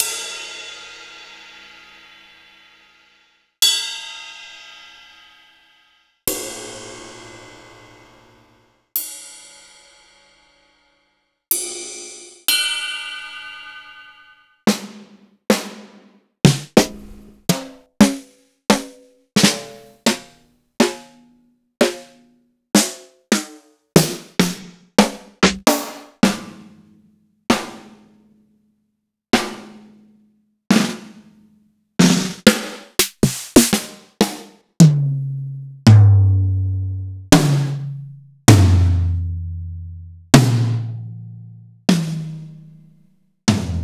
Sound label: Roland XV5080; Roland XV-5080 (Jenny)